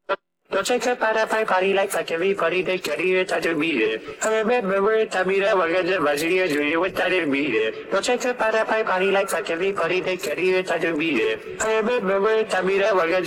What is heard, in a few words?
vocals; htdemucs